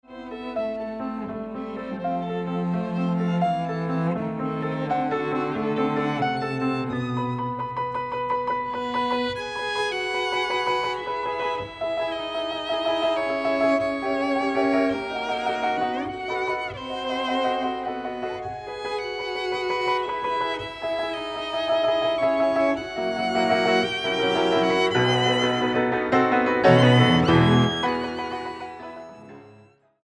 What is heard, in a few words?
Tristia for Violin, Cello and Piano; Classica; Trio; Trio Dmitrij